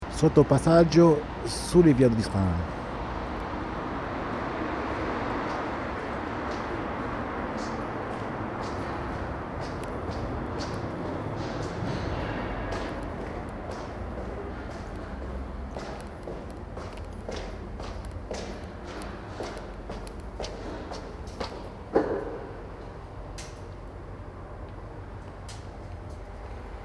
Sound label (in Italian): sottopassagio